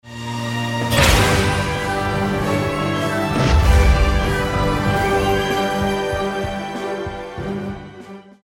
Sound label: rainboom